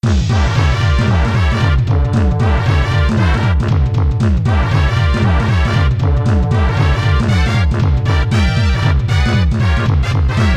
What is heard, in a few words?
Movie BGM, Jazz; Mario Artist Talent Studio